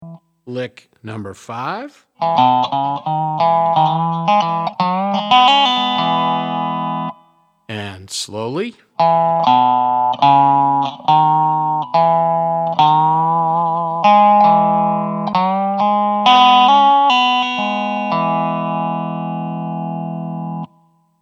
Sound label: resonator pedal, steel guitar